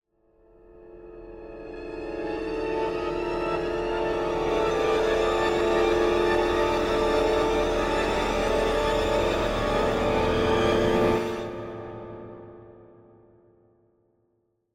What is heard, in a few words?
Symphonic Hit Confused Violins - Pad; Hollywood Edge; sound designer's toolkit v2